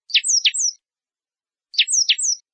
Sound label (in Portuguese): canto bicudo